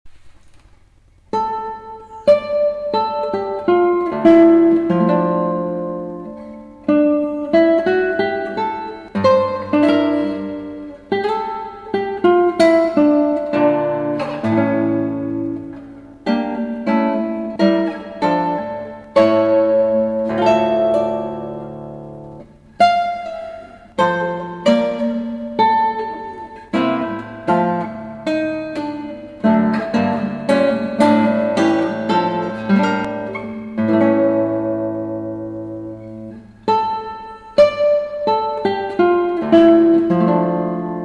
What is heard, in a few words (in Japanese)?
エンデチャ, ギター; エンデチャ、尾野ギターで